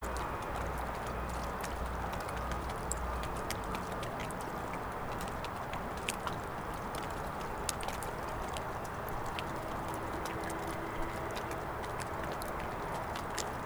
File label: Ambience; ambient or nature